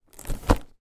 foley gift present wrapped box place down on floor